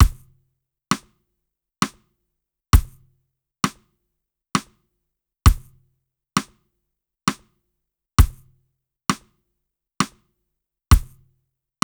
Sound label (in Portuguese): adágio 60 bpm